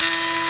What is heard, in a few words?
floppy sound fdd5 full isolated